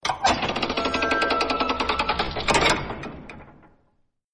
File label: drawbridge sound; Arc of Templar